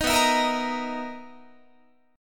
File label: B Major 11th B down Guitar; strummed